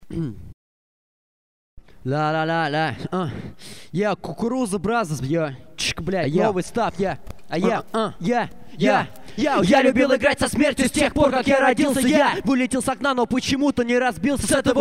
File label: acapella